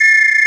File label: Whistle 2 Note Tr; Planet Earth; Digital Sound Factory Planet Earth